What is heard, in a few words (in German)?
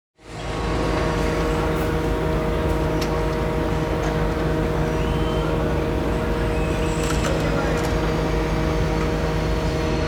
Stromklangplatz, sound is amplified and shaped; Soundwalk; The sound colors the acoustic environment of the square and modulates all other sound events in its surroundings.; A Soundwalk for Klangspuren Festival 2025